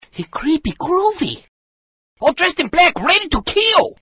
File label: creepy groovy